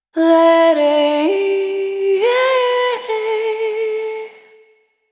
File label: Single Voice Melodic Loops